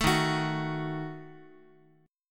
D sharp Diminished D sharp Guitar; strummed; D#dim; 12,11,9,x,x,x; JGuitar

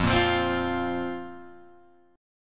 F Suspended 4th F, Guitar Open G

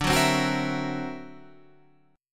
D Augmented 9th, guitar

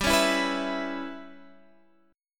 G 6th Flat 5th; G6b5 strummed; x,10,11,9,12,12

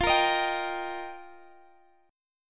G Minor 7th Sharp 5th, F

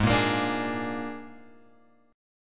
G sharp Suspended 2nd Flat 5th G sharp, chord; G#sus2b5 Chord {4 1 X 3 3 4} strummed

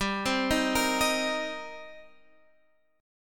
D sharp 6th, G

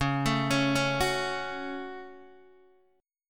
Db Suspended 4th Sharp 5th, Guitar, DADGAD

